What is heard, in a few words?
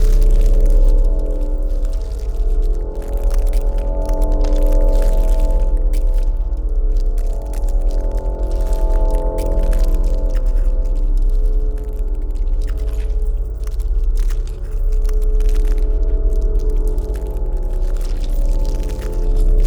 ship science station idle; Stellaris; biogenesis